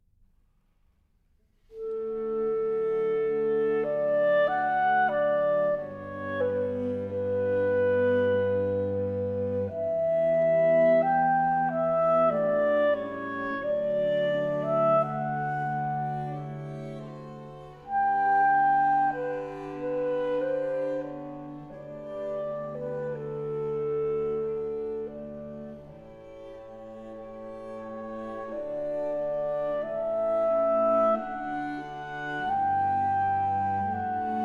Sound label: Larghetto, clarinet; Mozart Concerto & Quintet for clarinet